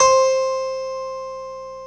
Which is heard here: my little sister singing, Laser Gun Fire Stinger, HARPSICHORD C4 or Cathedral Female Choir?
HARPSICHORD C4